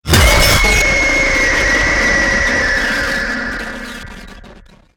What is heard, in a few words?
jumpScare scream; Universal